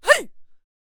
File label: Shouter Attack Vocals